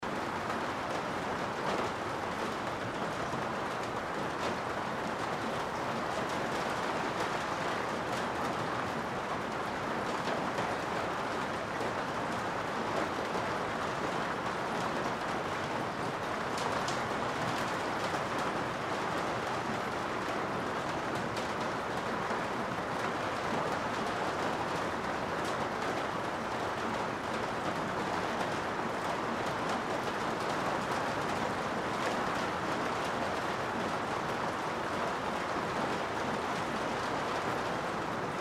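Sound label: Rain interior Pouring Garage Roof; Rain Sound Effects; Rain (Sound Effects:Weather); Big Room Sound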